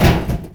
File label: Impact Small; Totaled; XBOX